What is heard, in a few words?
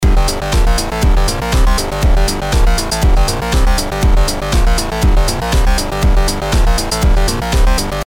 pulse bass